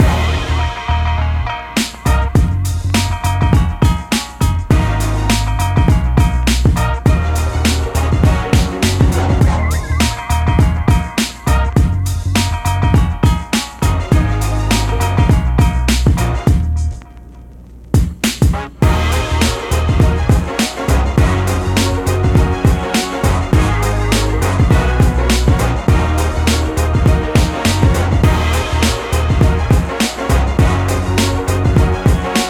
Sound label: BOSS Shredder Loop; Teenage Mutant Ninja Turtles Shredder's Revenge